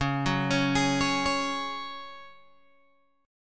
Db Suspended 4th Db, Guitar, Open G; arpeggiated

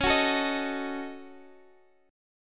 D sharp Minor 7th Flat 5th C sharp

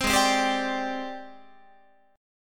G sharp Major 7th Flat 5th Guitar; G#M7b5 Chord

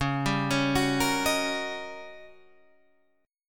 D sharp 7th Sharp 9th

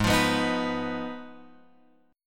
Ab Minor 6th Ab, down, Guitar, Open F; strummed; JGuitar

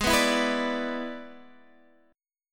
E 7th Ab, guitar

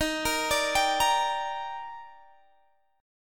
Eb Major 7th, Guitar Drop D

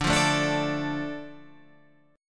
D Minor D Guitar Standard; strummed; JGuitar

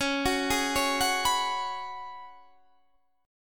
Gb Suspended 2nd Suspended 4th Db

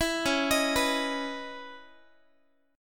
C sharp Minor 9th Guitar; arpeggiated; C#m9; 19,21,18,21,x,x

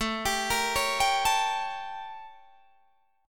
A 7th Flat 9th Guitar Open D

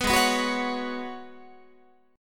G sharp Minor 11th G sharp Guitar, chord; G#m11 strummed

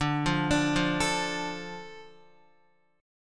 D Minor D Guitar; Dm arpeggiated; JGuitar

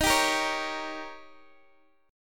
Eb 7th, Guitar, Open D

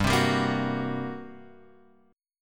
G Minor Major 7th Double Flat 5th down, chord; strummed; JGuitar

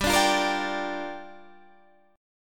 G Minor 7th, Guitar, Half Step Down

